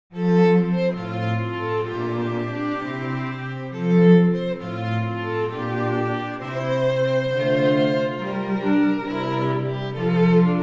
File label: water music, string quartet